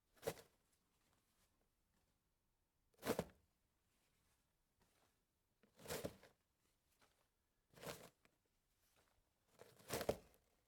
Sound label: pull tissue from box